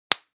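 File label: lipstick open hard, Bathroom; haus-garten; badezimmer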